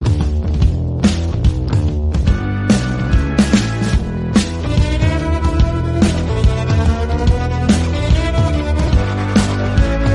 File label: Mood Off Ringtone; ringtones